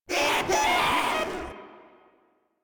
Proto Infested player spotted; Warframe; InfestedNinetyNine

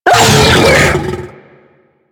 Infested Juggernaut Vocal Pain; Warframe

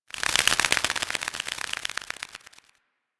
Forest Butterfly Flaps; Warframe; Grineer Forest

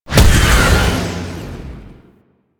Impact Small; Weapons; Warframe; Duviri; Incarnon Adapters

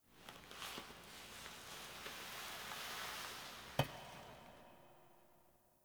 Foley Touch Mirror; The War Within; Operator

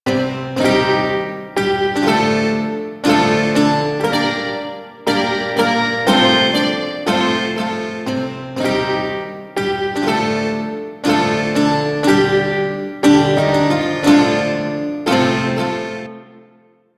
Shawzin Bard Music Loop; Duviri

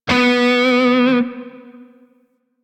Shawzin Flourish Oct Two B; DE; Warframe; Grineer Shawzin

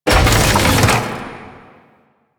Customization UI Open; Kahl; Warframe; Veilbreaker

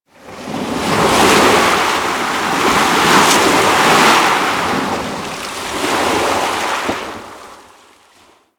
Ocean Wave Crash; Warframe; Grineer Ocean

